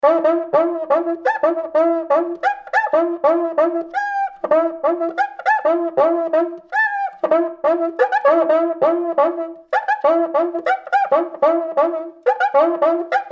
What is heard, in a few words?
Cuica